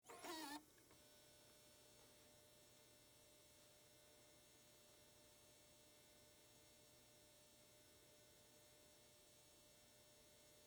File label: HD Camera Play, Hd, Camera, Play, Cassette, Tape, Sony, Hvr-Z5